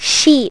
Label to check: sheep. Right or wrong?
Right